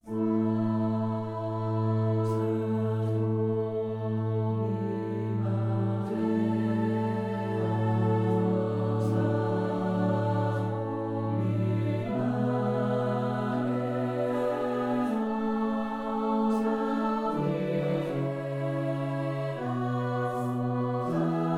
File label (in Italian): Sanctus a 4 voci; Biamonti